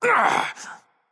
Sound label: grunt hit; smite